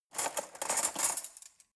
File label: cutlery forks drawer grab soft